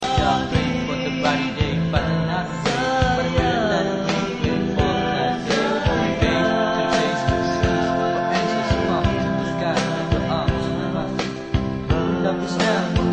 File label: Is Calling Rap, ringtones